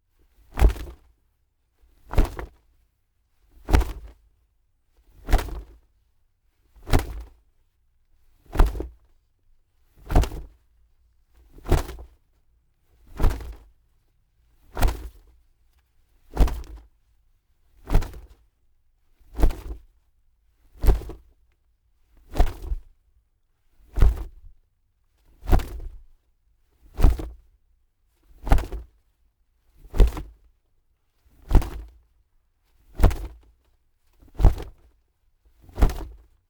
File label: wings flap